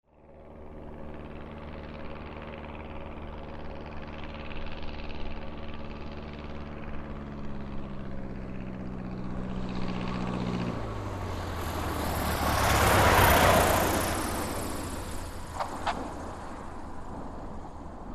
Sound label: Light Aircraft Landing On Hangars